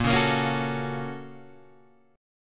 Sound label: Bb Minor Major 7th Sharp 5th, Guitar, Open G